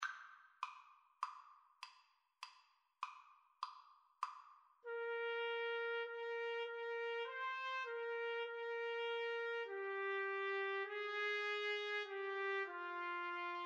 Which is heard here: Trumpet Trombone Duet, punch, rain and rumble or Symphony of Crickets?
Trumpet Trombone Duet